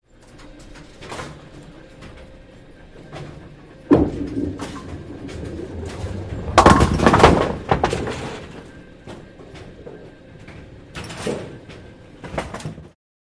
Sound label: Automatic Bowling Pi, bowling